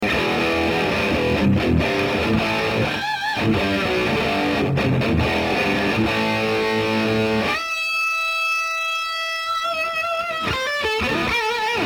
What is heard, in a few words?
Kustom Hv 100 sound overdrive; lampowy preamp; comba gitarowe